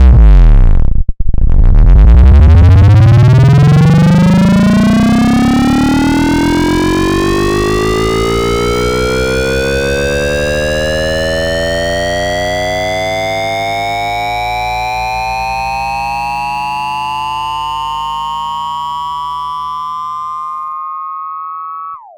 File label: Wobblin N Rising, Basses; AMG - Prototype Drum & Bass